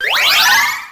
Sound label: Coin; Puzzle Bobble